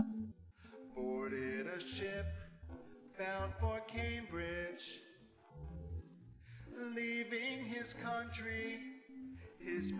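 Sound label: nodrums instantaneous source; SiSEC10; underdetermined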